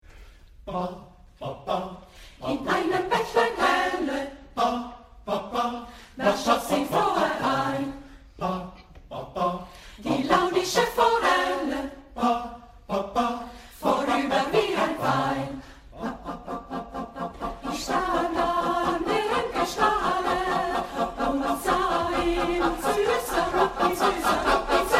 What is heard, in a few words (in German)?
forelle Chor; forelle mozart